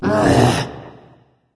ugh; smite; voicepack; hel

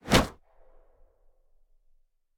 swing; odin; gugnir; smite

